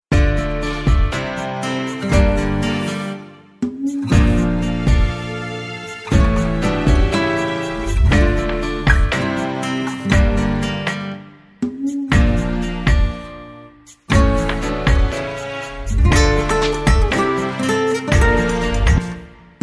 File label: tango, dance